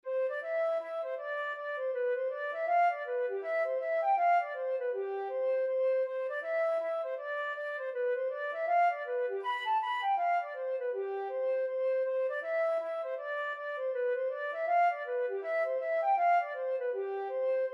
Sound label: kit o mahonys hp, flute